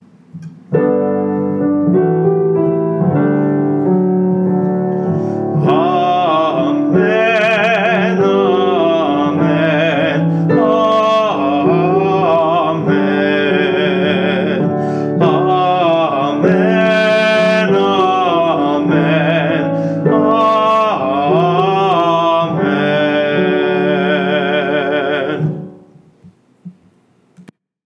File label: amen eng and span